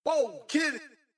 scream; kenny

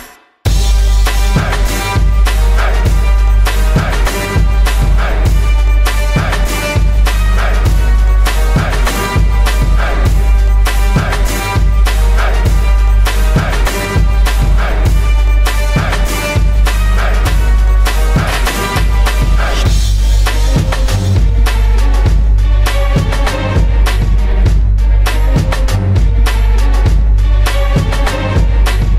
march remix

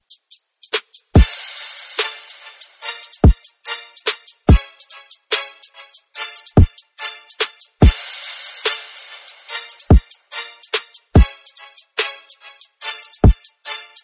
instrumental music

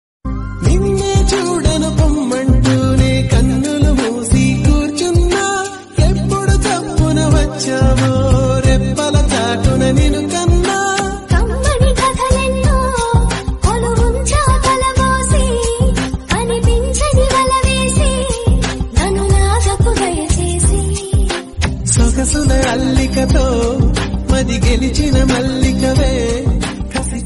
love songs telugu

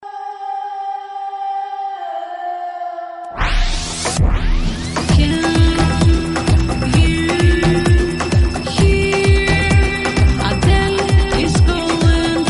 dance

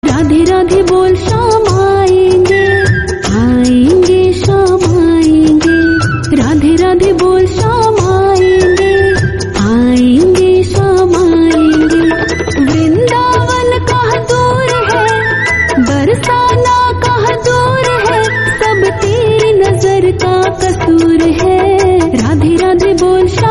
devotional

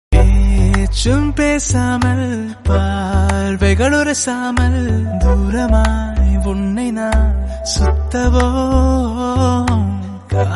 folk love song tamil

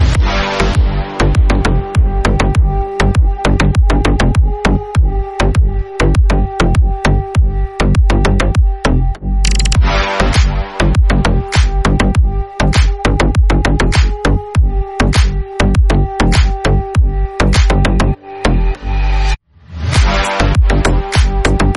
funk

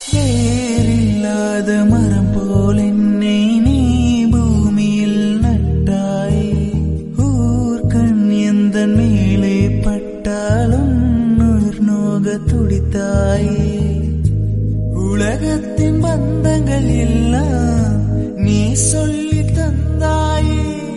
amma birthday song

